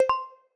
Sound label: notification; Codex